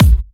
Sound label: Hard Kick; Vengeance Samples